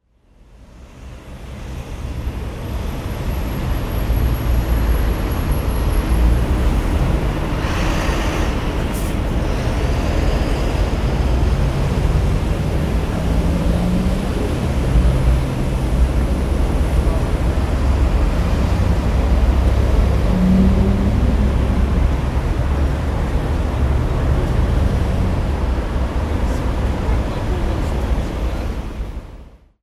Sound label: marylebone road